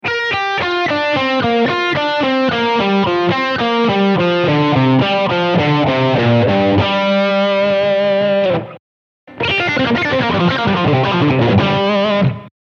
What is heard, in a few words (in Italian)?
Pentatonica Sol sestine discendenti